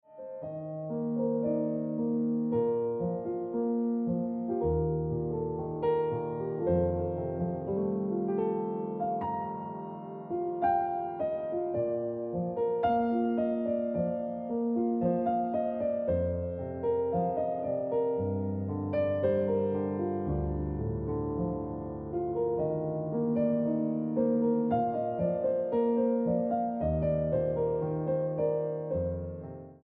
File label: Ringtone Piano Music